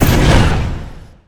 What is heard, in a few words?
Flame Thrower Shot Burst, Weapons